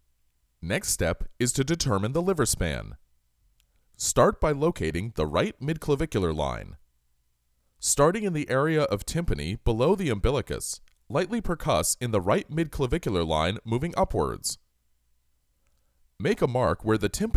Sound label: Percussion; Ab Percussion